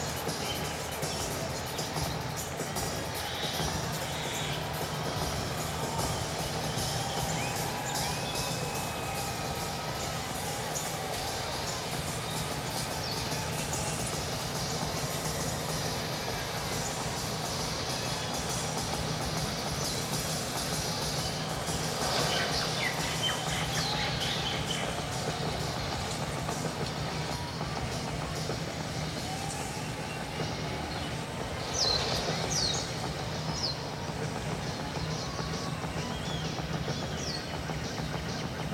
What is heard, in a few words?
Drums